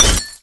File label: glass hit; The Sum of All Fears